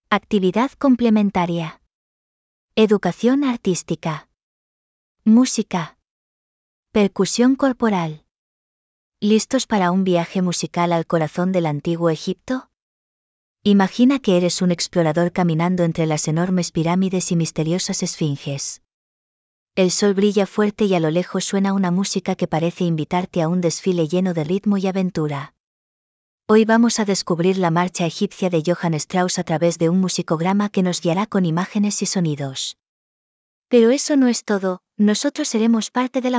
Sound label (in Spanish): musica percusion; mquinas para el faran